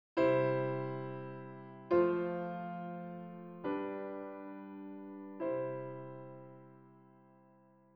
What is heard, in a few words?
Altered Chord